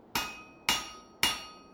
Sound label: blacksmith; sfgame